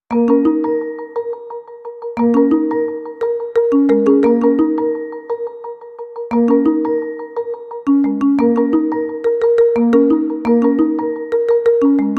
Driving Mallets

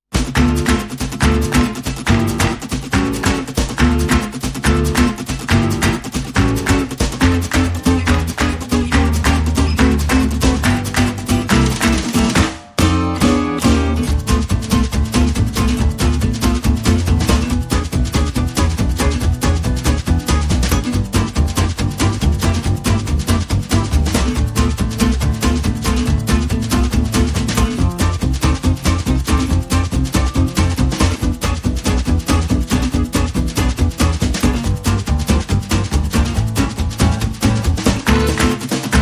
No Crowd No Lead Guitar

